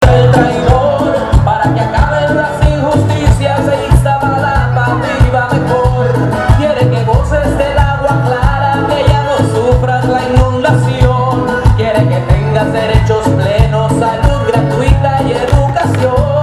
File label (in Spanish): cumbia